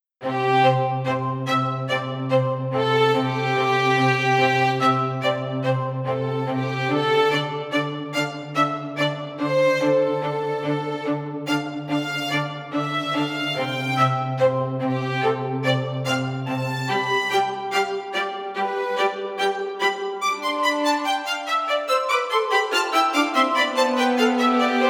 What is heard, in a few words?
Four Strings